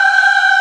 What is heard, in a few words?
VOC; E-MU Producer Series Vol. 5; 3-D Audio Collection; 3D Multis; 3D Sprint Select 2